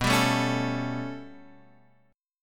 B 7th Suspended 2nd Sharp 5th down Guitar Half Step Down, chord; B7sus2#5